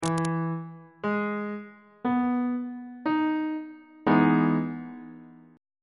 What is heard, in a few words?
d double sharp major 7th chord on bass clef